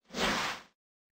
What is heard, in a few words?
level exit; crash bandicoot